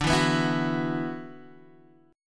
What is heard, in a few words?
F sharp 7th Suspended 4th Sharp 5th D